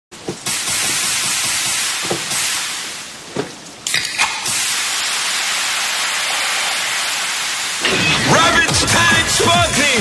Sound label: Build sparkling